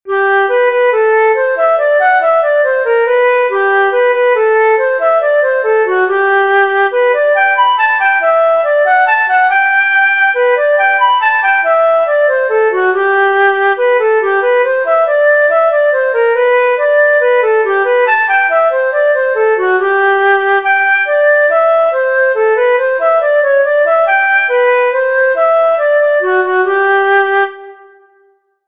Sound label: simple melody